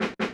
Snare, Hip-Hop

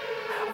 Alarm Ring; RnB

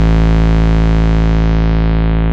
Bass Long A; VEC1; Club; Long Basses

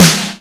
Snare; Takebeat; Club; VEC1

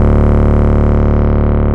Bass Long D; VEC1; Club; Long Basses

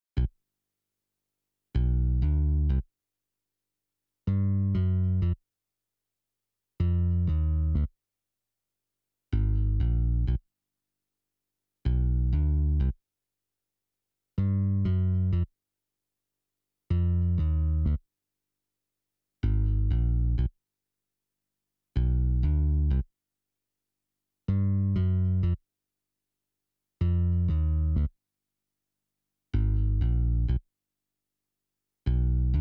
BASS

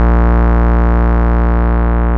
Bass Long B; VEC1; Club; Long Basses

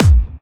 BD Clubby; VEC1

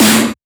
Snare; VEC1

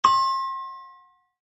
Bell Ding

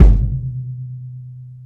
Kick, Hip-Hop, Drum Kit

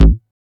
Bass A, Offbeat Bass; VEC1; Club

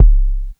BD Sub; VEC1

